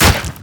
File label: meaty deaths